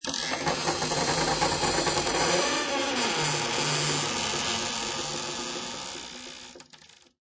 Creak Long, Multilayered heavy wooden door with hinges of metal creaking.; Creepy Doors; Horror; Lynne Music